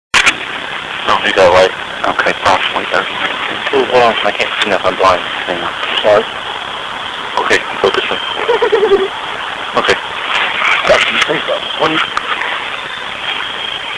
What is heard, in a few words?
laugh